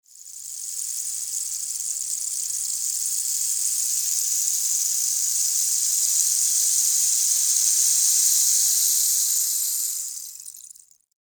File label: shower tablet